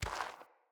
Walk Gravel, Footsteps; Characters; Enemy; Mockeries; Mockery

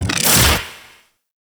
Leap Whoosh; Warframe; Corpus; Arachnoid Hunger; Abilities

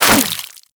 Boomerang Fast Whoosh; Infested

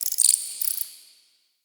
Tiny Creature; Ambience; Under City

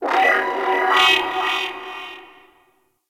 Corpus Ship Data Chatter, Ambience; Warframe; Corpus Ship Remaster

